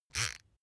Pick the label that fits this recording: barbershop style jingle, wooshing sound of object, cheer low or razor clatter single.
razor clatter single